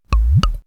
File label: Wet Sub Beat, perc fx; X-Static Goldmine